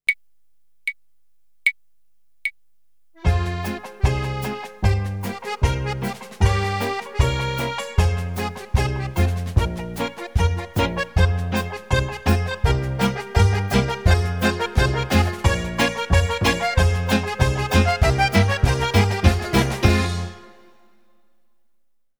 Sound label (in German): Ungarische Melodie